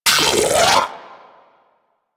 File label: Arachnoid Vocal Pain; Enemies; Vocals; Warframe; Corpus; Arachnoid Hunger